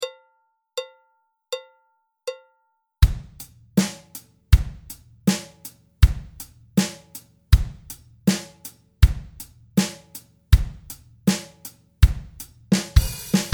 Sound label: drum beat slow